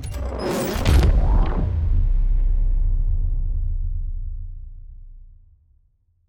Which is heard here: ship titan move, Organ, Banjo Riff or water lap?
ship titan move